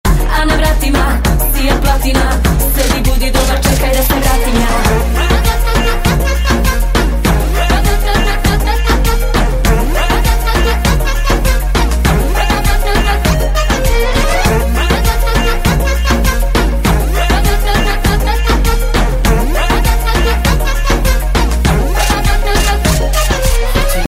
electro ringtone

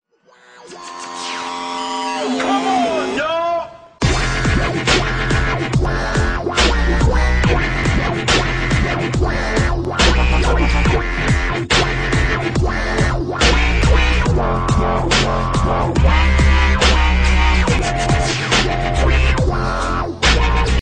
dubstep ringtone

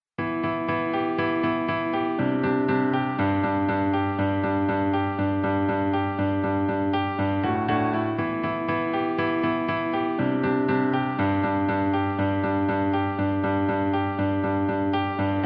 piano ringtone

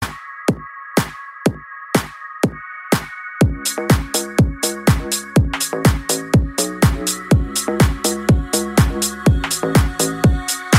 dark season iphone 8 ringtone

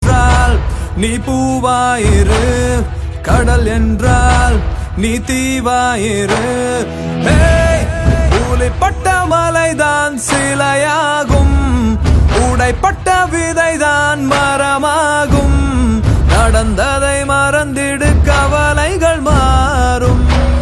tamil ringtone

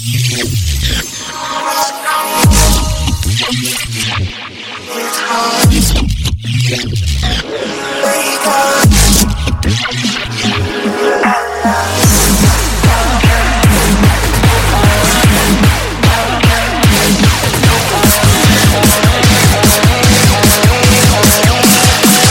iphone x ringtone

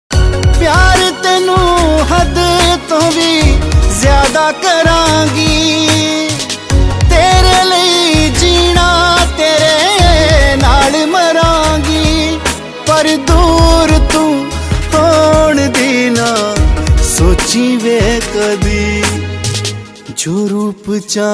sad ringtone

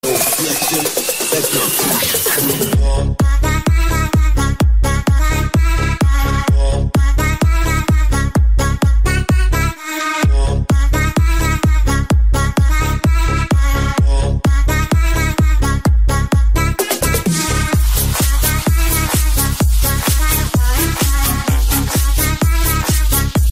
apple electro house ringtone

